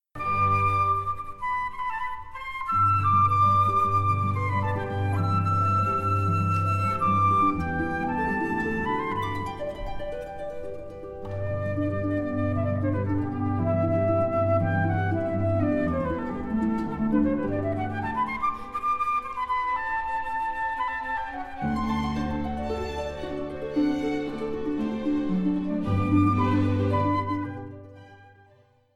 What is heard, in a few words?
Flute Harp Con C